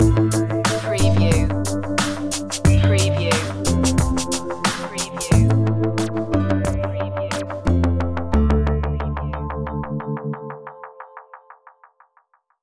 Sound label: hip hop stinger